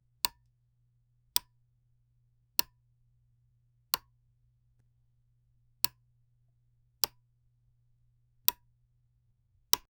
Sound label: button hover